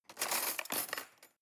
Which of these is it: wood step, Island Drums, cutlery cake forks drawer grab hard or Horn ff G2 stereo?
cutlery cake forks drawer grab hard